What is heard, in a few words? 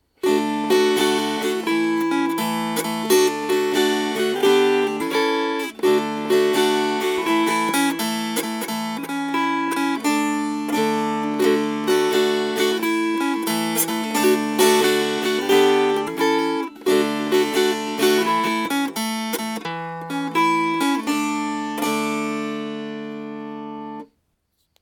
Strummed